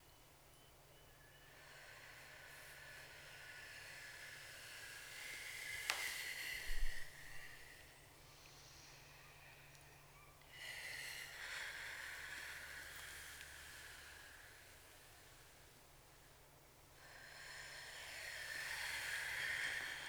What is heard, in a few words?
space and pressure, a sonic dance performance